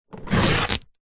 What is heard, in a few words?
musical violin in case pick up from ground; zapsplat